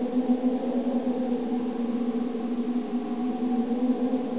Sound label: all wind